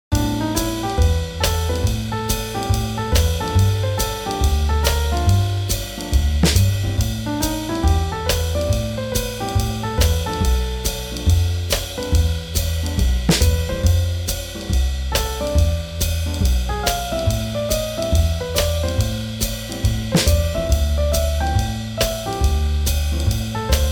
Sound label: Swing Jazz